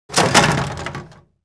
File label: Large object drop heavy plastic impact; gfxsounds